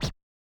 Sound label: Yamaha DJX 2B, Scratch F